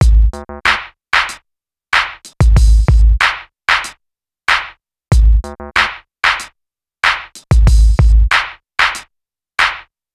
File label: drum loop 094, Hip Hop India; 094bpm; Hip Hop India Modern Percussion